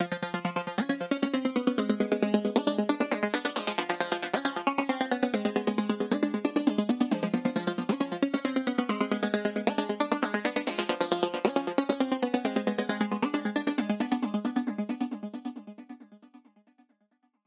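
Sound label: Goa synth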